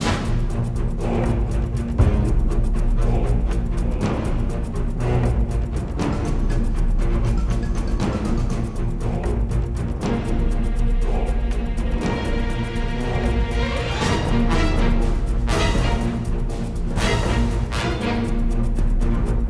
Combat; The Hobbit; PS2

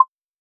Hover; Cobalt Core

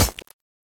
Impact Flesh

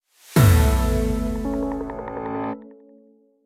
Skill Endorsed Stinger

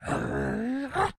Leap Wind Up; Joblin

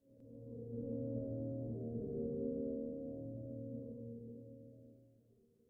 Jungle; lethal company

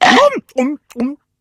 Chew; KingPig

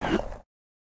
Dash Stop; Caffiend

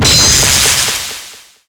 Enemy Water plant Active Hit

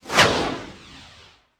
Flyby Missile Slow Mono

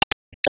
Se Enemy Holder Bound

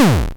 Hit hurt

